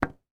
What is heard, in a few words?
household cell phone back cover drop on carpeted floor